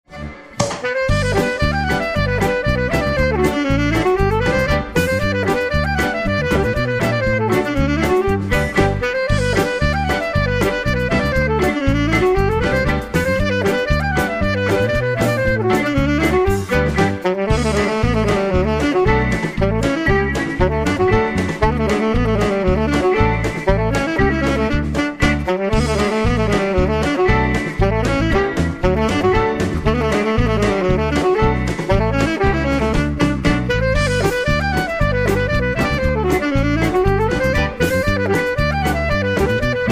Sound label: Polka